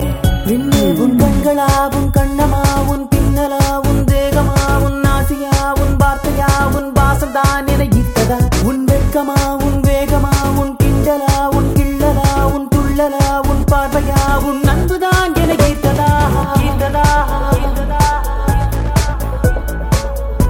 ringtone; blues

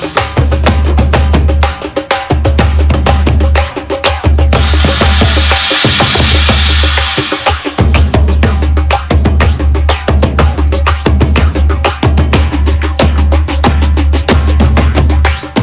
drums beat; dance

